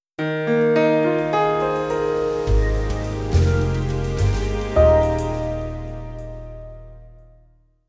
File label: Logo; Brand Leader Logo